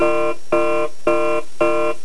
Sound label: FIRE